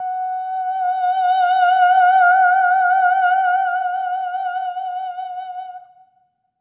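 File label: soprano "oh" F#5; Spectrasonics; Symphony of Voices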